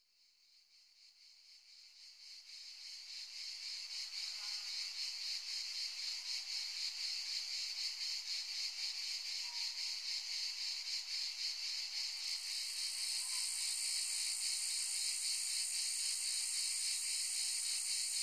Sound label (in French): Bruitage - Cigale grise et Cigale noire - Les chants de l'été; Nature & Decouvertes